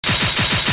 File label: knife swish; csco; csgo; weapons